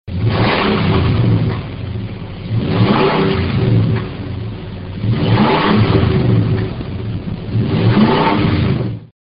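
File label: Nissan Armada 5.6L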